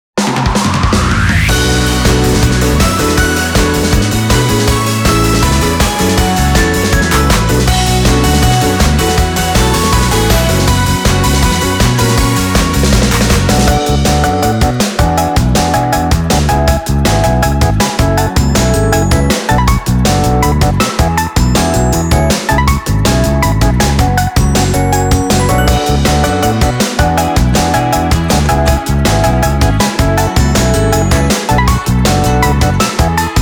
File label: Raindrop Bouncing again instrumental; Clutch Shooter 02